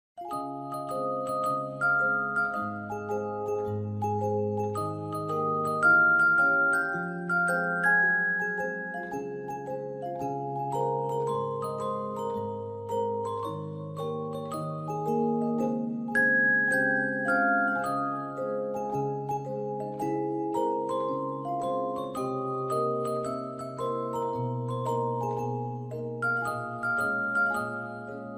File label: music box